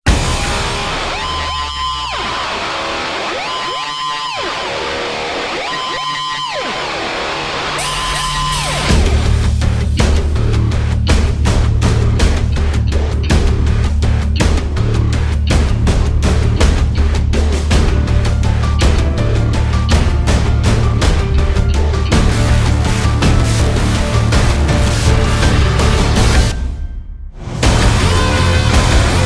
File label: no guitar